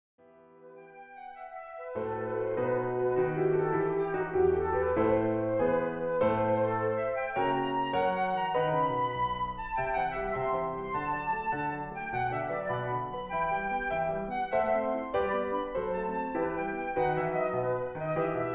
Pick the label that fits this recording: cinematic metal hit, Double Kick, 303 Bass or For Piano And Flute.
For Piano And Flute